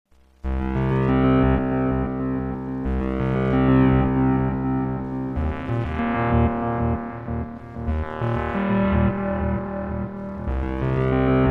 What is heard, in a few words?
Boss DM 101 Synth Pan